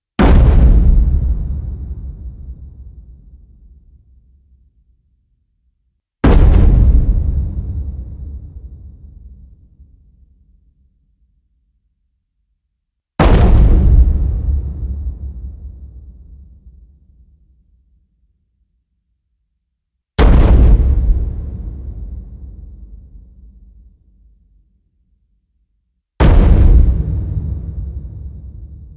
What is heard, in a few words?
hit taiko giant